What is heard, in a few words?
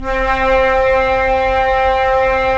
Flute, Roland LA Composer